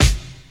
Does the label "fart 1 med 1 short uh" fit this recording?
No